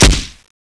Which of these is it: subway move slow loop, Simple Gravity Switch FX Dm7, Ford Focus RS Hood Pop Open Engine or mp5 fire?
mp5 fire